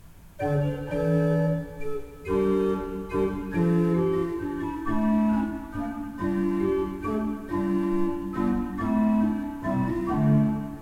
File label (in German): Breil, gebrauchtorgel